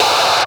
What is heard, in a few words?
FUZZ CLAPZ; Spectrasonic Distorted Reality 1; PHUZZ DRUMS